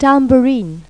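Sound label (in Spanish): pandereta; Pipo-Aprende musica con Pipo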